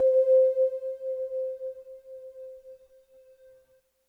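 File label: sine C4, Yamaha VP-1; Music Store; 90's Sample CDs; NICE SINUS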